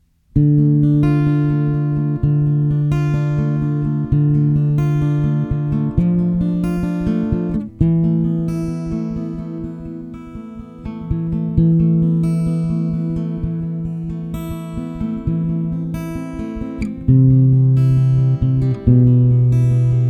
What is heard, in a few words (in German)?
behringer zupf